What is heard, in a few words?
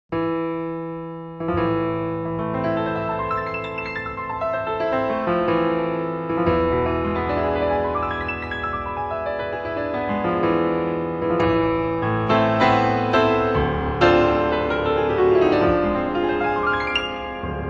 Keyboard